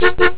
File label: beep